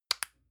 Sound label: lotion lid close hard; friseur; berufe